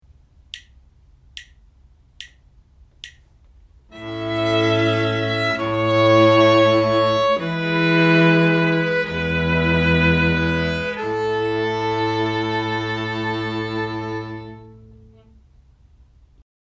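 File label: harmonic error detection, string quartet; ear training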